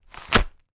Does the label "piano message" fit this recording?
No